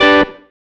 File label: Stab, Organ, One Shots